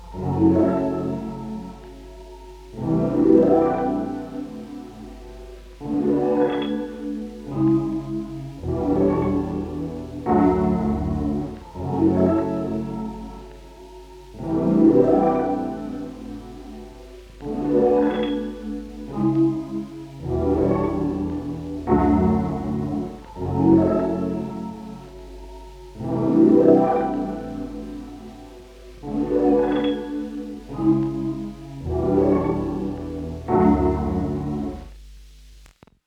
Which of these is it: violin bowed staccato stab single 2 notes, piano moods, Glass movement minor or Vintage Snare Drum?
piano moods